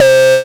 Synths C; vengeance essential club sounds-3

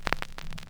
Record Start, Vinyl Record

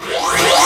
Short Fills & Reverse; Vengeance Samples

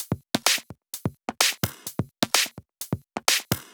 Drumloops 128BPM

